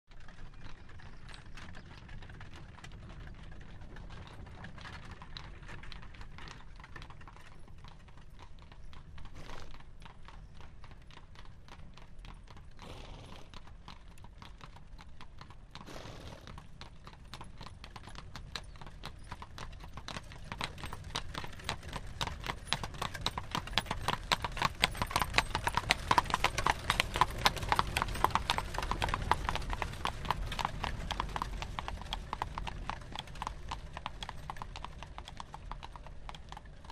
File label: Carriage By Slow 1 Tar, carriages